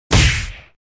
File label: hit punch; С руки; Звуки удара; Звуки удара для Форсакен